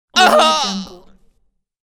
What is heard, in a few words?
male killing moan